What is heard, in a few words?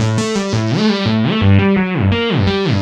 Mod Mix B, 170 bpm, A, modular; modular madness